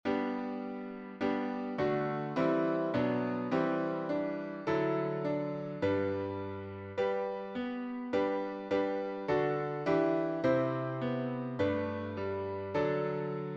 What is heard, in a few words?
piano synth; Little Free Music Library